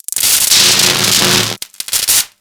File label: Ocean Electric Surge Large; Warframe; Grineer Ocean